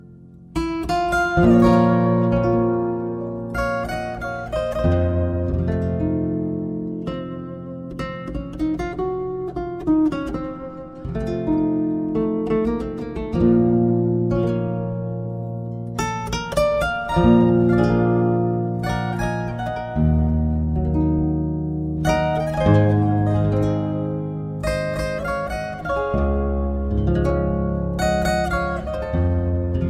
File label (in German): Improvisation, zither